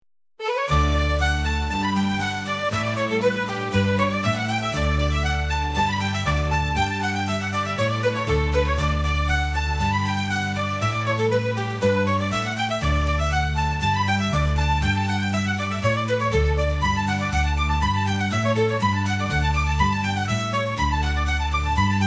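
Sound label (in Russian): highland reverie, volynki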